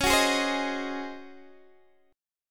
C sharp Major 7th Suspended 2nd Suspended 4th C sharp, Guitar DADGAD; strummed